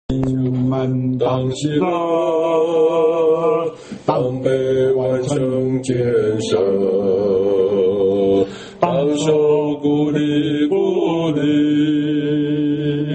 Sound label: hymn; worship